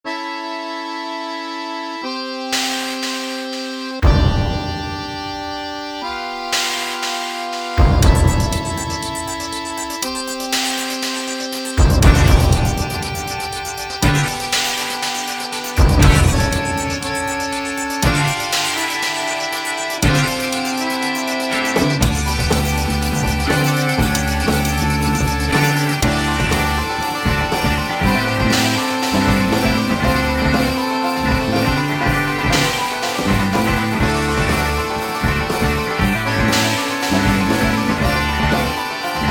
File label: Accordian